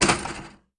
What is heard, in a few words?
sound Spring Trap